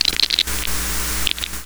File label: glitch; Tascam